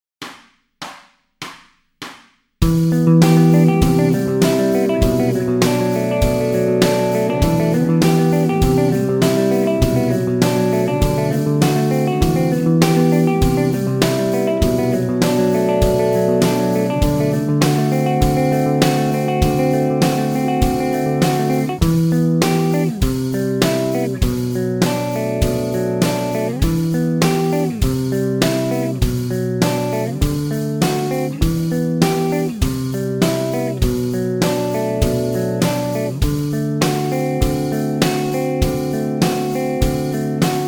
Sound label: Riff, guitar